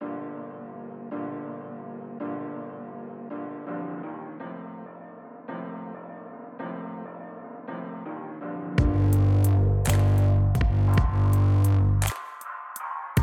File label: dark piano waltz 82bpm